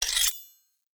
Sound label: Dagger Equip; Weapons; Enemy; Daggers; Sinecure Dagger